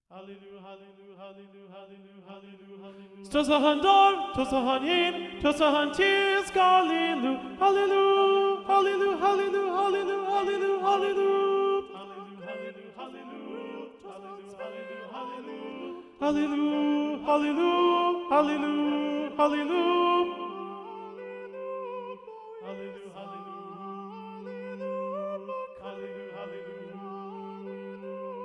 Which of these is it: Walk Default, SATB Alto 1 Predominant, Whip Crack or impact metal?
SATB Alto 1 Predominant